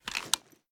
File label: reload empty start; gmod